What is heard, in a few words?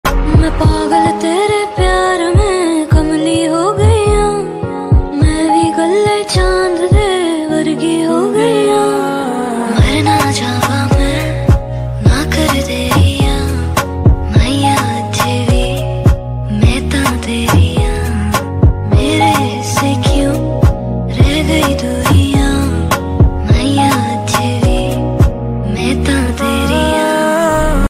ringtone